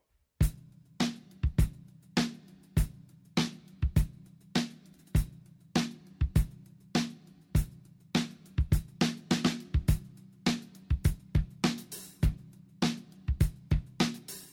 Funk; Alfred Publishing

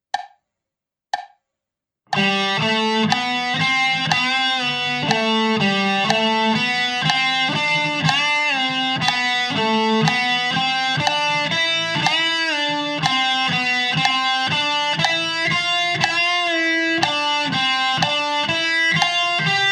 Bending Exercise; Alfred Publishing

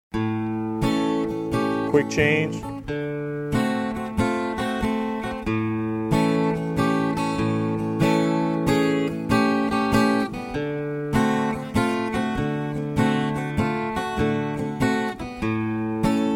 Blues In A; Warner Bros Publications

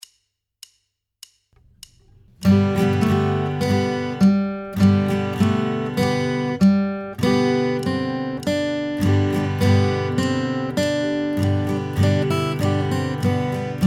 Frere Jacques (Duet), guitar

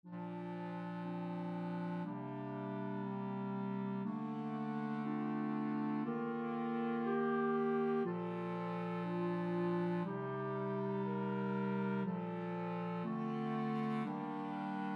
clarinet

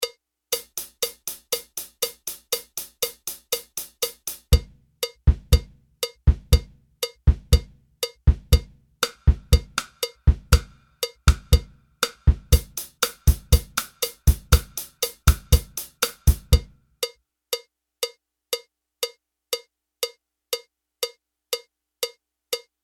Basic Bossa, jazz drumset

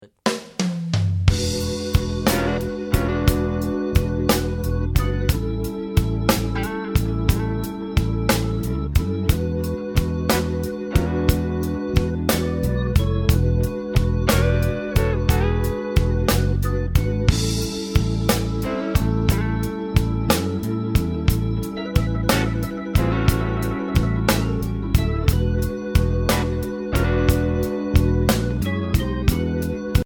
12 Bar Blues Beat; Warner Bros Publications

